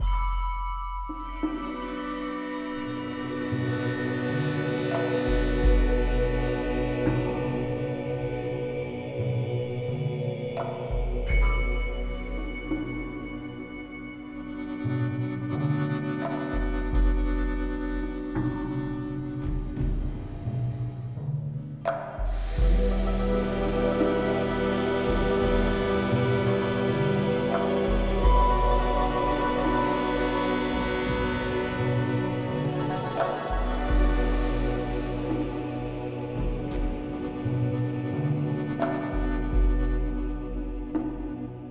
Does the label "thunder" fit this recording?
No